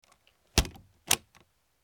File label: Latch